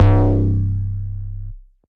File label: Noise Bass; Distroy Noise Bass; Ableton; Punch and Tilt